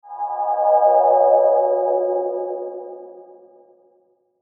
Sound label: SHIMMER SOFT, Shimmer Soft Swell Flange Filter; Blastwave